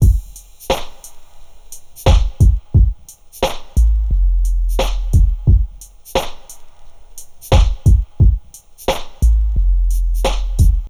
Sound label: DRM, Hip Hop and R&B; Best Service ProSamples vol.02; AKAI